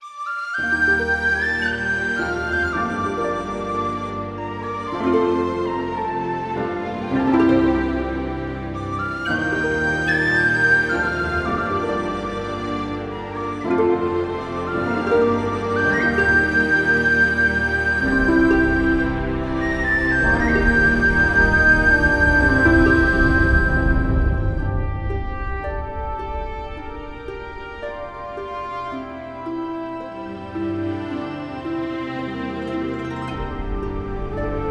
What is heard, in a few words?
tin whistle calm track; whistles; versilian studios